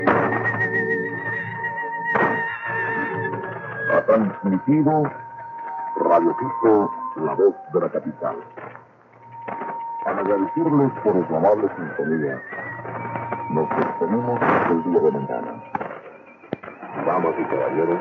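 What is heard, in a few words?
Radio 4920; R Quito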